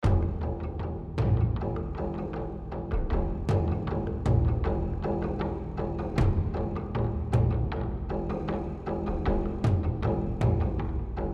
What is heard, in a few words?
Rage Combat